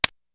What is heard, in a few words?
Tick